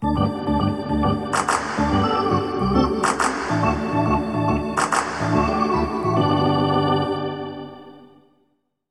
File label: Hockey Organ